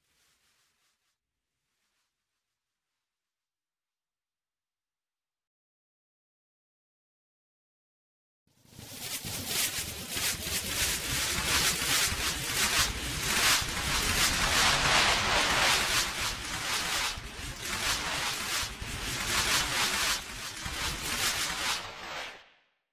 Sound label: In Flight Sparse to Thick